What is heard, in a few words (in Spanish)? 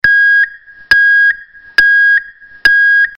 tono; zumbador con 8 sonidos 115v ca negro